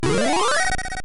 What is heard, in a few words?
Evolution Sound; Nintendo e-Reader